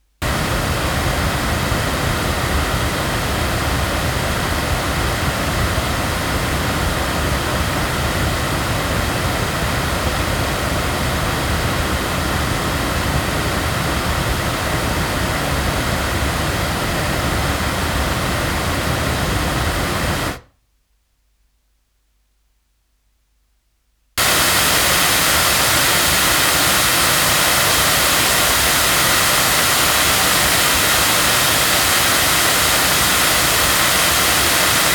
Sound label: AudioTechnica AT4080 loudspeakers